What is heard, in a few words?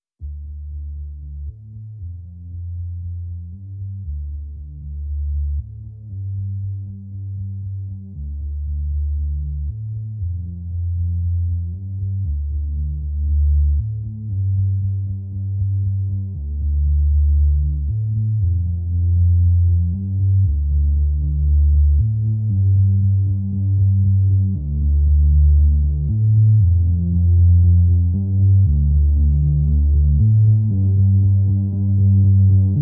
Hook